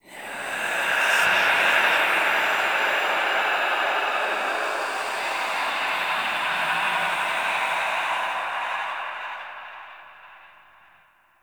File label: Temple Pillar Whispers; Warframe; Ambience; Orokin Derelict; Red Veil Temple